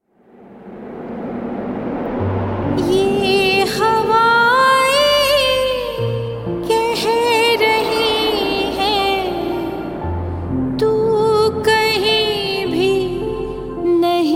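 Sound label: Sad, Hindi song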